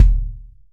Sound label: small reverb steel kick drum sample d sharp key